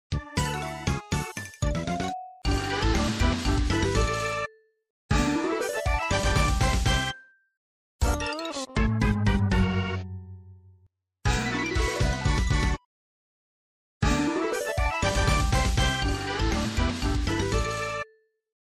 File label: Jingles; Young Cricket; WarioWare Gold